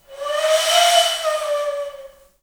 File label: AF FLUTES, Heart of Africa